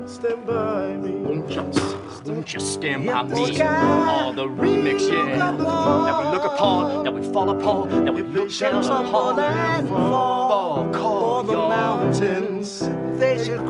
Rap; Barney Stinson

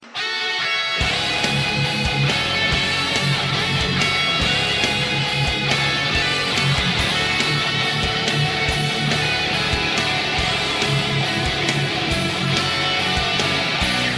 Metal

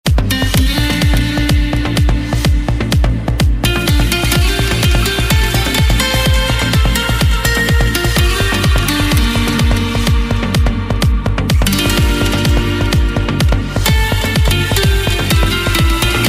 Synthesised Guitar

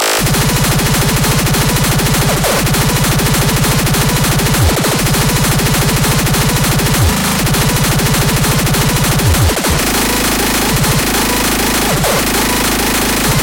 Very Heavy Bass; meloboom

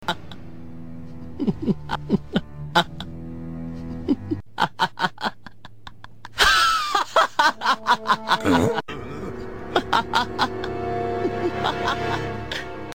Laugh

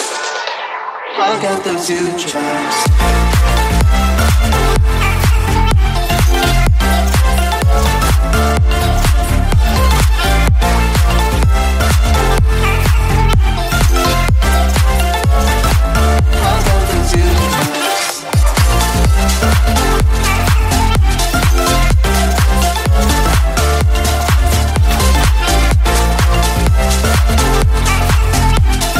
Future Vibes

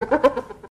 Hyena laugh

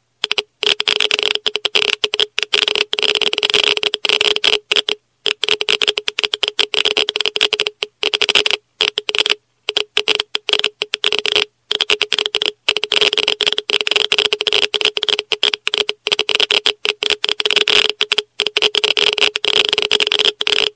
Geiger Counter Sms

